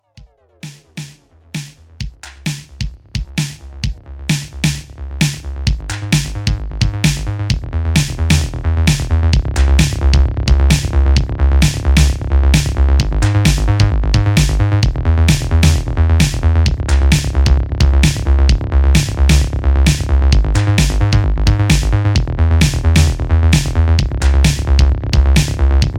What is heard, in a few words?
SYNTH ALIEN